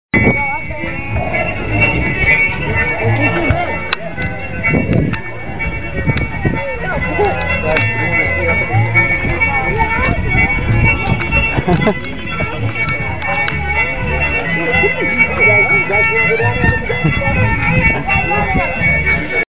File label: band fiddlin; Fiddling